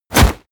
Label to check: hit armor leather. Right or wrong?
Right